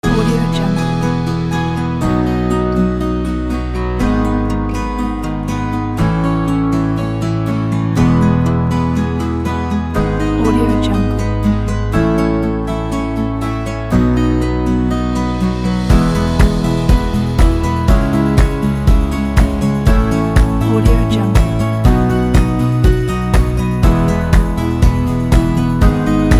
guitar loop

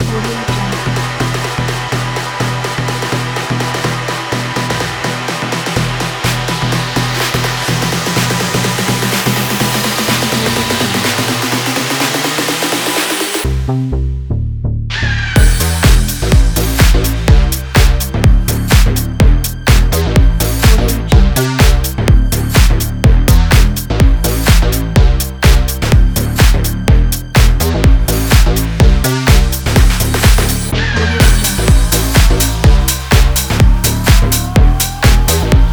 modern pop fashion; sergeycohen

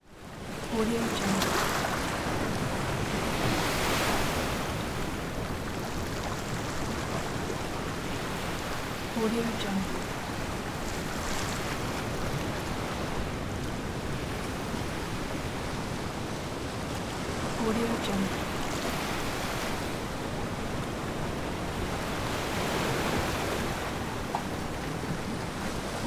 beach

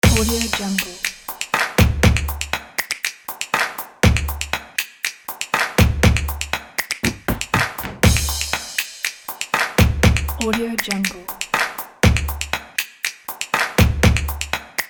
this is claps

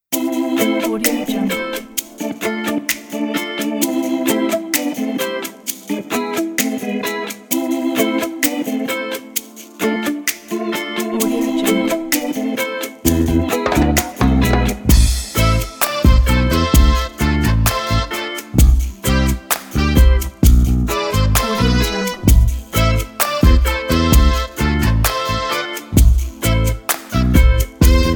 reggae; studiobell

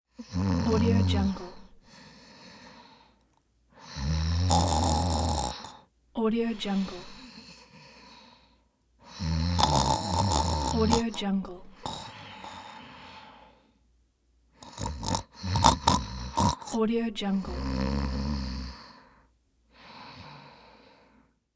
snoring while sleeping

